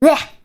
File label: ooff; Gawr Gura